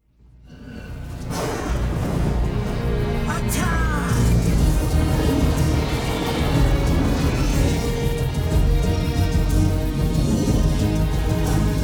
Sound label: attack